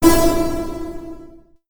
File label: magic wave